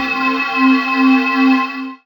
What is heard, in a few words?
beam